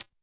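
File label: Hi-Hat Closed Synth H; YAMAHA RM1X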